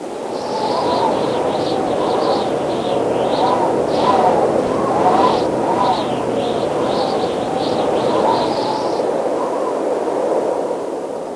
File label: distant Wind Quiet; NETSTORM